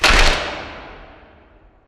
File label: Assembly Plate Lift Impact Metal Close; Boomtown; Titanfall 2